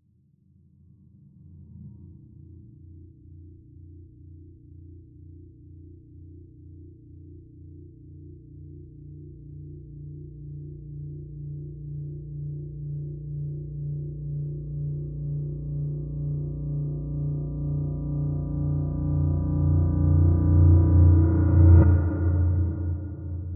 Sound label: Long Tail Reversed Cosmic Piano Chord